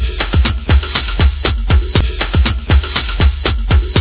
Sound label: beat